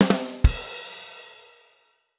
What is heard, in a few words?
budum-tsih; Logi; Loupedeck Sound Pack